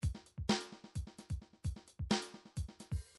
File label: drums funk